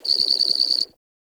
Clan Dojo Garden Animal; Warframe; Garden Animals

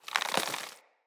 Squid Plant Uproot; Traps

